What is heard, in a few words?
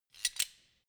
wrench slide close soft; schrauben drehen hydraulik